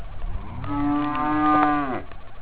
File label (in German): muh; ostfriesland